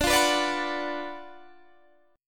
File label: Gb Major 7th Suspended 4th Sharp 5th